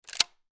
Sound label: weapon gun; charles daly; still north media